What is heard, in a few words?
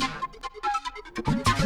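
Music Dpad Base 145; From Dust; ps3; xbox 360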